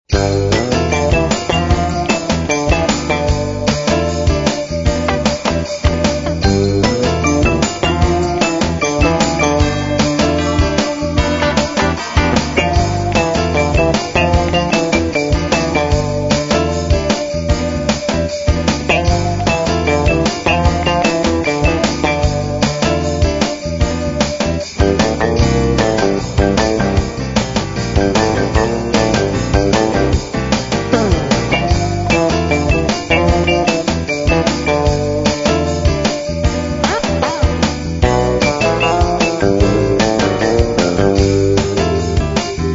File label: music; coal elf challenge